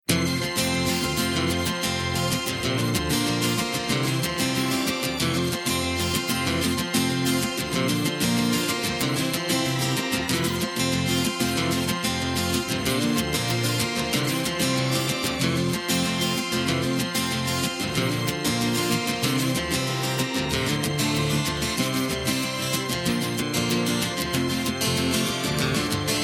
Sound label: (F) No Backing Vocals